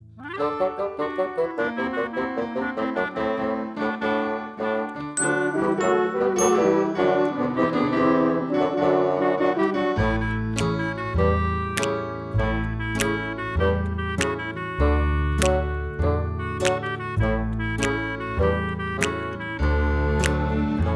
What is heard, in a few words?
Instr.